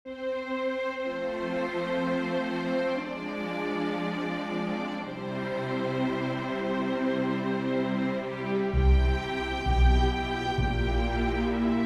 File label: anthem; Ue anthem 2.0